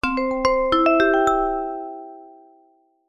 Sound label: multimedia alert chime short musical notification happy; zapsplat